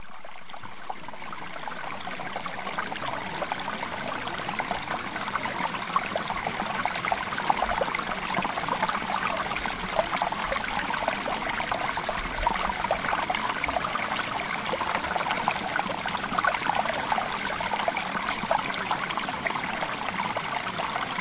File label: Mountain Stream